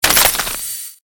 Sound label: Created Magic Spell Ice Bolt Impact